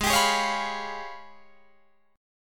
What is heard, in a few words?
G sharp Minor Major 13th, Guitar DADGAD; strummed; 18,22,18,18,20,21; G#mM13